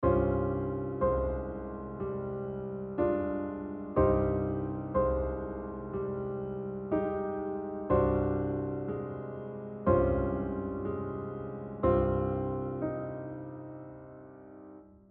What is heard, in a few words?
pedal point - ambient